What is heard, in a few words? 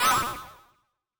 Jester Get Hit